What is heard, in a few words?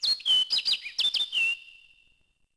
Forest Bird; Civ4 warlords